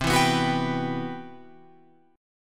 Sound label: C-Major Sharp 11th, Guitar Open F; CM#11 strummed; JGuitar